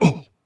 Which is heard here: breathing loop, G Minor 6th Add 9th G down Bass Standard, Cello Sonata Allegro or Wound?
Wound